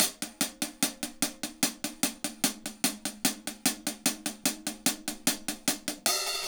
HAT, HI HAT LOOPS, Dub; Drumdrops In Dub VOL-1

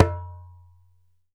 ASHIKO, African Perc

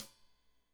Hat Tight, Hi-Hat; Deeply Sampled Drums

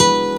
GOOD GTR, Synth FX Misc

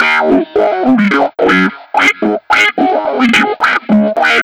Guitarlike, Leads And Riffs; Sonic Foundry (Sony Creative Software); Synchro Funk

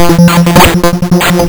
lsdj waves; wavetables

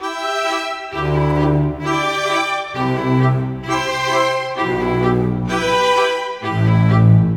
Cinematic Strings, Orchestral; Sonic Foundry (Sony Creative Software); Orchestral Series VOL-3; Cinematic 14

